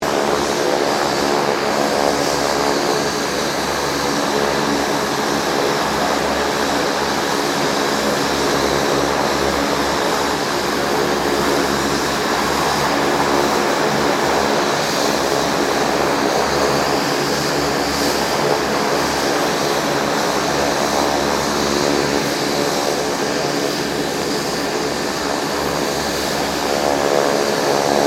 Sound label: AIRCRAFT 2007 KING AIR 350 IDLE; Airplanes (Sound Effects:Vehicles)